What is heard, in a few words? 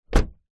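vehicles car door close gentle exterior; zapsplat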